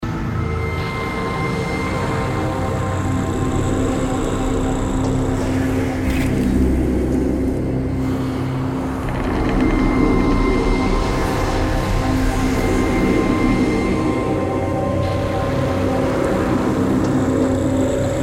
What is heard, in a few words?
Whisper